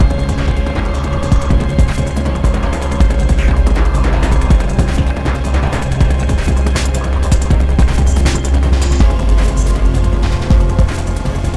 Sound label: Waterworks Murky Medium; rive wreck hack die retry; ps4